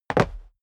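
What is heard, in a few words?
step dress boots concrete jump, footsteps; schritte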